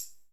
perc

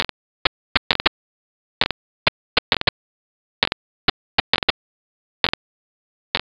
rhythm

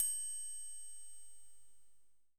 perc

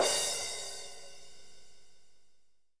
jungle crash

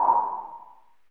wind

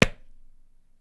tabla; Dirt-Samples

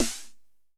snare

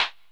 snare; Super-Dirt project

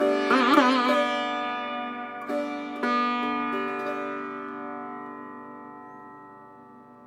d maj sitar chord

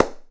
hand mono

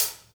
hihat; sid

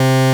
juno raw mid

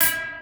big clang

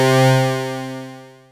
juno release mid

